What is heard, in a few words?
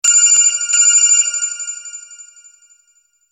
ring